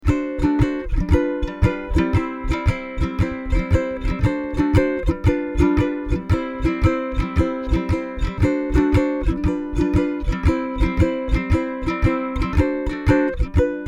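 vamp strumming, Hawaiian Vamp Chords & Picking on Ukulele; Hawaiian song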